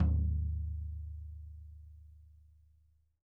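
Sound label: floor tom; drum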